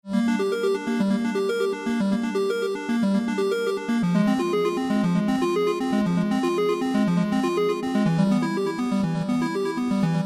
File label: dungeon arpeggio; ZELDA 2 - DUNGEON - ARPEGGIO - START LEAD OVERLAY